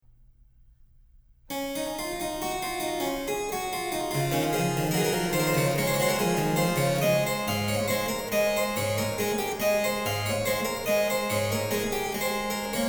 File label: Invention Harpsichord; Bach